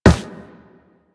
Run Metal, Footsteps; Warframe; Operator

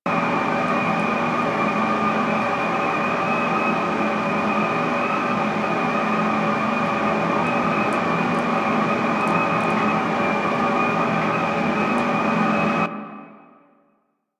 Air Vent Loop; Warframe; Ambience; Nineteen Ninety Nine

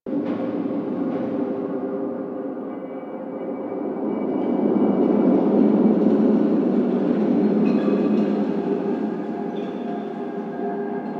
Asteroid Interior Amb; Warframe; Ambience; Grineer Asteroid

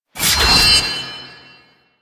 Finisher Sword; Enemies; Warframe; Duviri; Dax

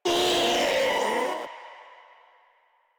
Infested Ancient Vocal Attack; Warframe; Ancient Ninety Nine; Proto Infested Ancient

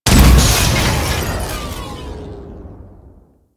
Terra Vent Explosion; Warframe; Corpus; Arachnoid Camper; Death

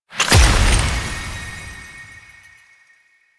UI Default Theme Save Look; Warframe

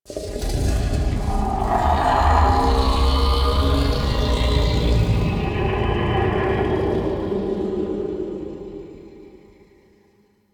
Growly Vocal; Warframe; Man In The Wall

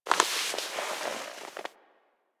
Classroom Move Foley; Ambience; Warframe; The New War; Quests; Zariman

